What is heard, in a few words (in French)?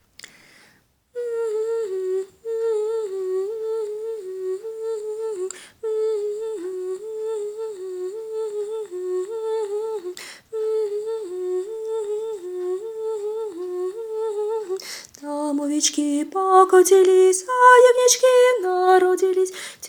basses; Tschedrik